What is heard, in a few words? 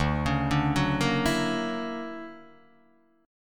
D Augmented Major 9th, Guitar, Open D